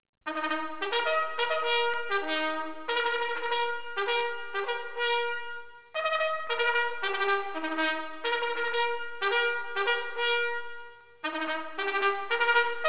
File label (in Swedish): korum, signaler; dragoner